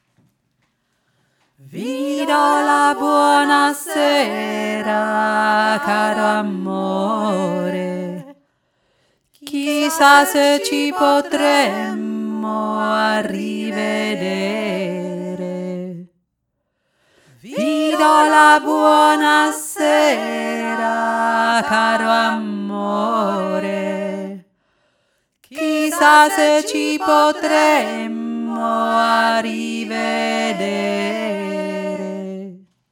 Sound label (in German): untere stimme; vi do la buona sera